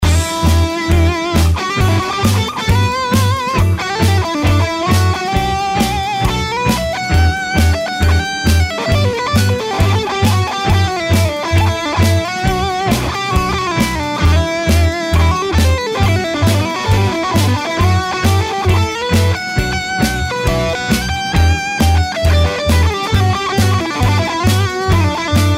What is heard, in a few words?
solo dry background louder no delay, guitar